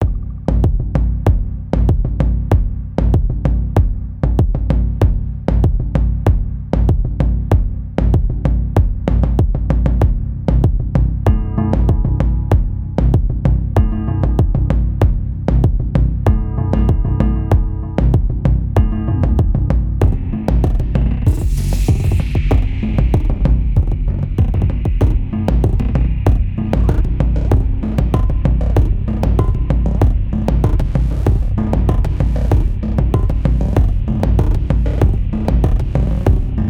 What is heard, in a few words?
Deep Dark Tribal African Drum focused; CUES for film TV games etc